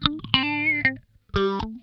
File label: Funk Guitar; Zero G - Funk Guitar